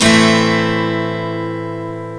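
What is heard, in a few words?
Acoustic Guitar Minor Chords, A minor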